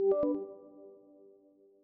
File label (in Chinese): 成功, 音效设计